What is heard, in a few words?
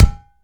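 closed tone, UDU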